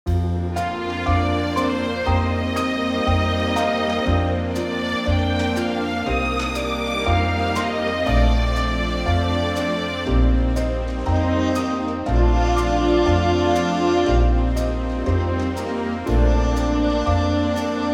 orchestra Bb

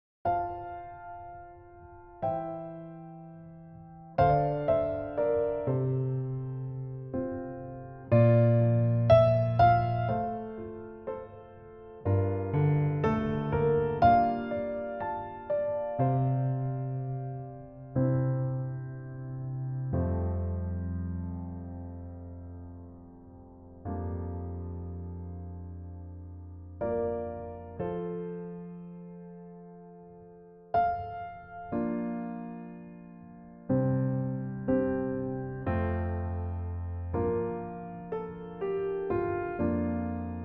piano F; key - F - vocal range - C to F

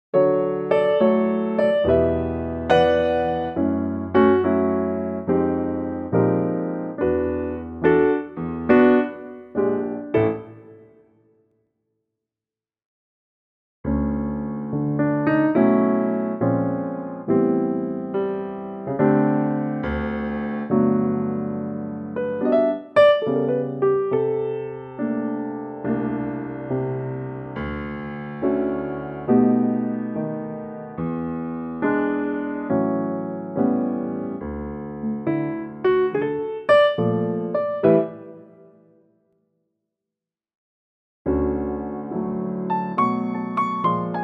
D piano

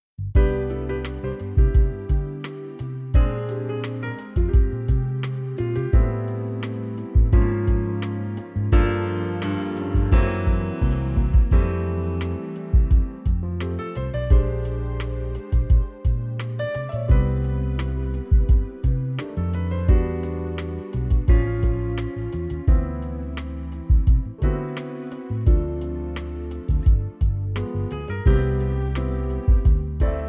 smooth

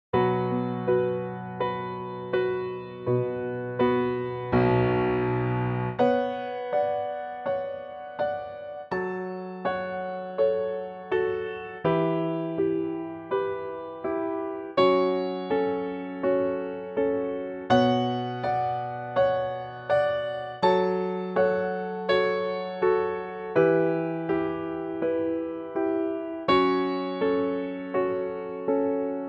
D Piano; piano only arrangement

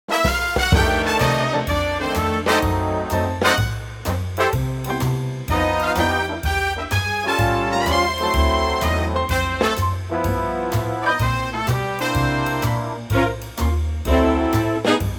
C Big Band

